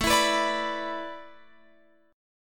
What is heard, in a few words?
A Suspended 2nd Suspended 4th, Guitar, Open F; Asus2sus4; strummed